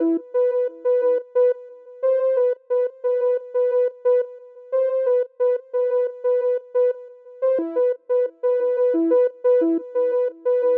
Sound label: HipHop Siren Emin, Synth; Digidesign; Pro Tools Essential Loops